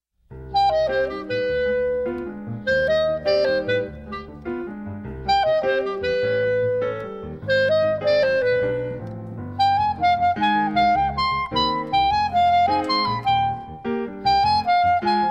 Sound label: Chacarera, A band with seven horns and a rhythm section, each instrument family is represented here.